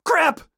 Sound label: police hit bad short; donnie; Blind Drive